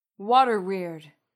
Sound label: water weird; bestiary; 5etools